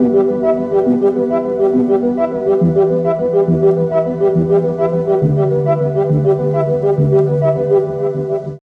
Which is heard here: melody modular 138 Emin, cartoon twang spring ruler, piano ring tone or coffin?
melody modular 138 Emin